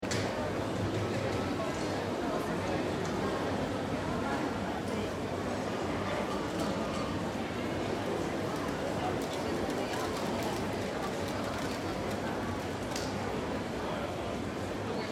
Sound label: Gallery Crowd, General crowd humm. Afternoon in art gallery, museum. Semi distant voices; occasional dog barking. Unregognizible language.; Crowd Sound Effects